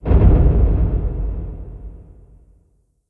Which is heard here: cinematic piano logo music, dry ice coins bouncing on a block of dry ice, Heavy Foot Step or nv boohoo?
Heavy Foot Step